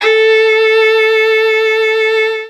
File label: violin